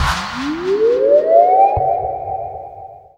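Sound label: synth, Production Effects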